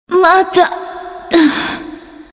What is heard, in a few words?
die; undead